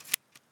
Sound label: m3 bullet, weapons; 590; garrysmod